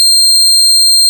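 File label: string C4; fleet keys Samples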